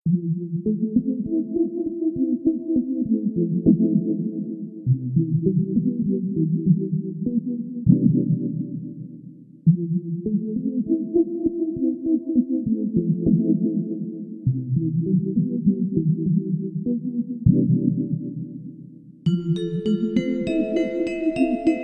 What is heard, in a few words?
gentle sound 432 hz; Kurtyna w dół...